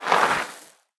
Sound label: slide